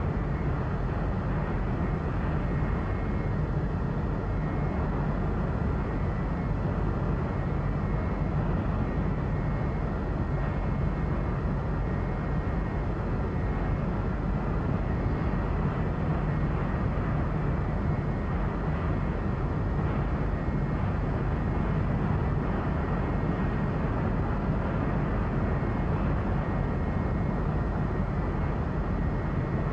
music laberinto

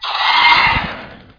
Monster; Conquer Online 2.0

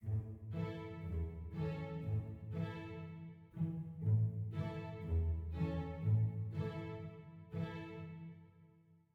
dark soft start

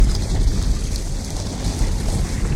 elevator; Puddle

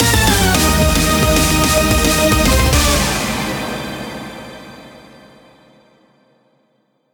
Jingle Game Over; Waifu Discovered 2 Medieval Fantasy

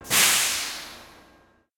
Door Air Release

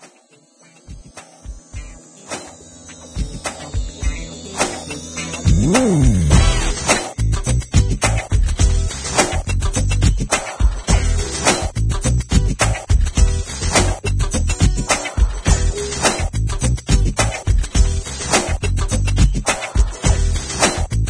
Menu Music; tiger woods pga tour golf ps1 gamerip 2000

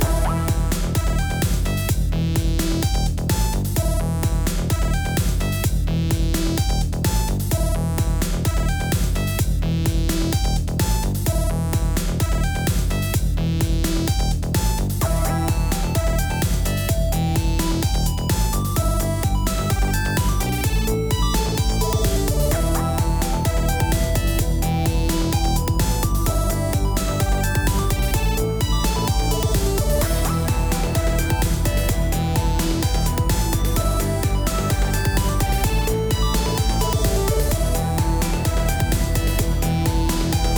8 Bit Blitz; metagalactic blitz

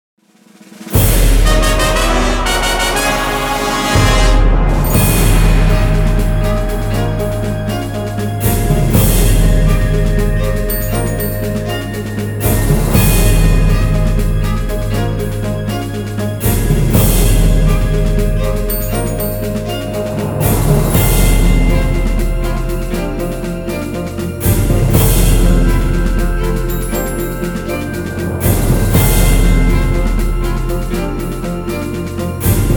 victory; Eternal Fantasy; Eternal Symphony

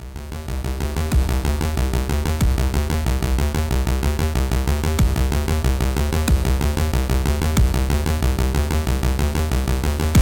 Quest Stinger 8Bit Theme Intro; Club Penguin Island; Android, iOS, MacOS, Windows; Herbert8BitTheme

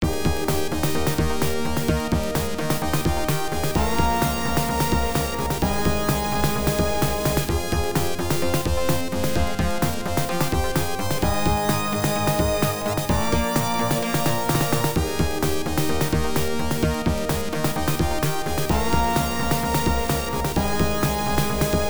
Character Selection; Animahjong V3; x68000

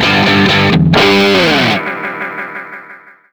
Solo Win

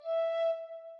Flute

